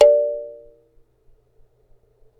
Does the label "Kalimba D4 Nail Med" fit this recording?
Yes